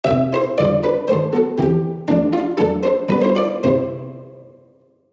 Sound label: Jingle Pizzicato